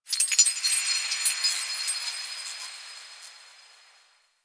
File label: Moon Chimes Small, Ambience; Warframe; Orokin